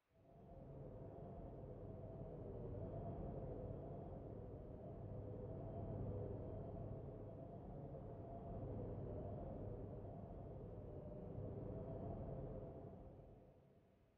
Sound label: Light breeze